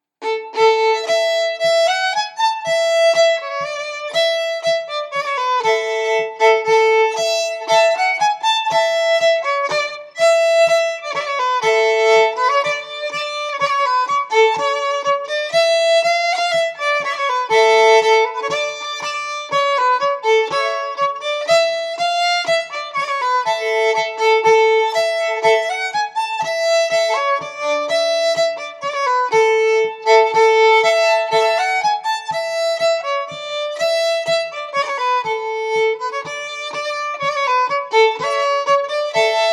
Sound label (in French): Bourree